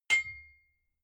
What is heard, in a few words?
hammer hit ring high